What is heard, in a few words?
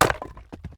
Chopping firewood; anemoi